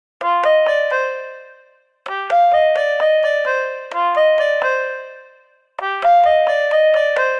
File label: Melodika Melody C Minor 129 bpm; MIDI Clips; Tonal; Ableton; Factory Packs; Lost and Found